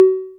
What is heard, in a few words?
bleep, Korg Electribe ER-1; Kit 8